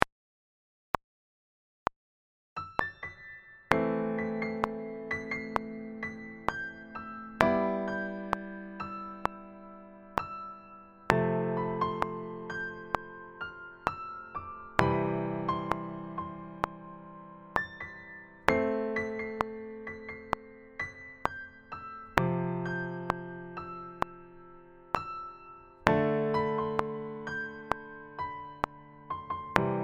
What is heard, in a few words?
melody